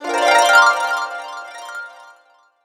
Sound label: Magical Harp Sweep